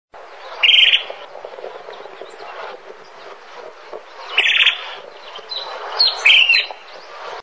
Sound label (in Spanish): voz chinchero